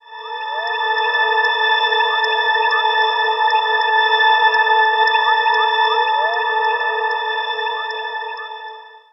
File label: Woodnotes A, Ambient; Zero-G Seismic Frequencies; 90 s Sample CDs